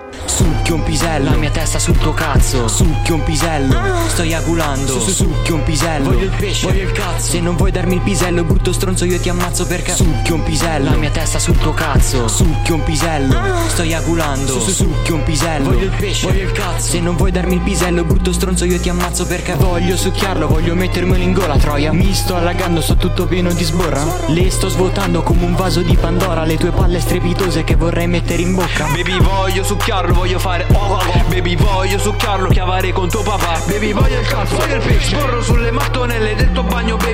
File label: applause